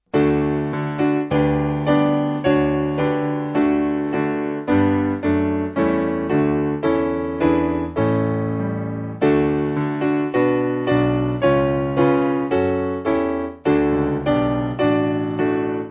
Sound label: Piano